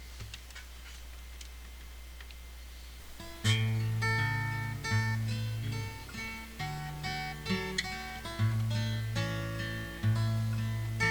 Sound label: Guitar Instrumental